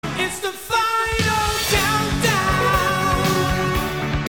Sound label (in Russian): песня